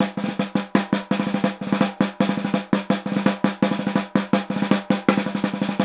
snare, Roland; LA Composer

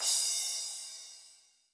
CRASH, Yamaha SY85 Drums

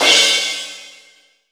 Linn 9000 crash; Akai MPC-5000

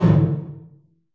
LR HT909, TR909

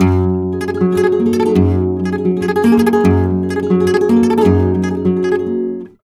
Flamenco, Guitar, Tremolos, f#

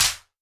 Wider Clap

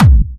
Clubby Kicks, Bassdrums

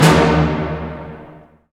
HIT ORCH.; Roland - Brass, Strings, Hits and Combos; Orch.Hits 1

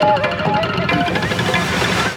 Kaos FX, Ambient Grooves

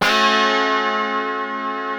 G 3C FUNK CHD, Guitars; Spectrasonics; Hans Zimmer Guitars VOL-1; FUNK CHORDS

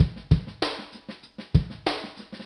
Swingn L, Yamaha DTXpress-II Loops

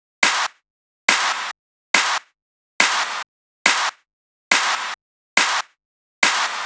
drum Loop 140BPM Clap

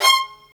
STR, STRINGS; Roland - LA Composer vol. 1

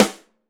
DRY SN, STUDIO DRY SNARE

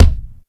ROCK KICK; Greytsounds VOL-1

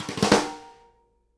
snare GLASS, Live Snares; E-MU Producer Series Vol. 8 – Platinum Phatt (CD 1)

